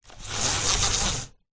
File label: household blind roller pull; zapsplat